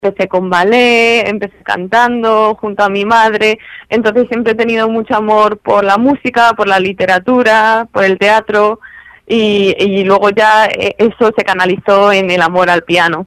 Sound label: concert piano inicis